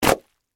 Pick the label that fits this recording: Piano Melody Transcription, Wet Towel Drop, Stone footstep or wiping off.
Wet Towel Drop